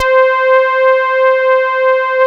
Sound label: Poly800 C4, Korg; Back In Time Records; Korg Universe VOL-1